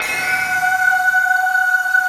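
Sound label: F#5, INDIAN BAMBOO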